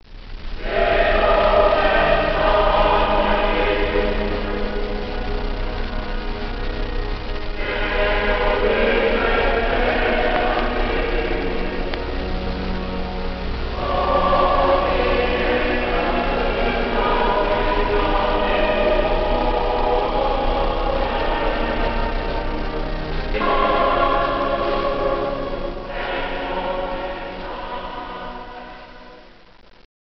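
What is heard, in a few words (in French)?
chorale (prise de son plus éloignée ); orgue cath oran; Te omnes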